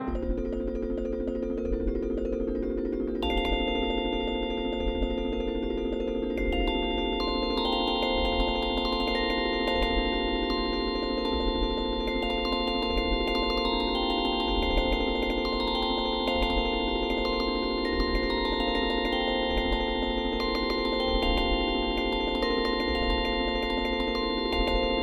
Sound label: BELLS